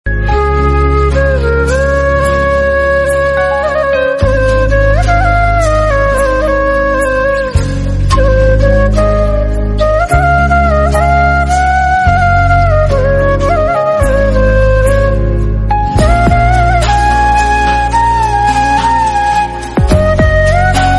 Christian instrumental

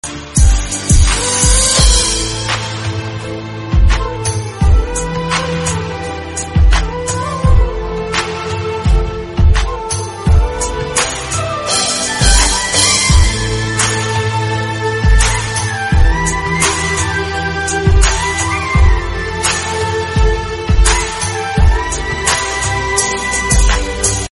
flute tamil; maryan

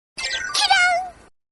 japanese notification sounds kawaii

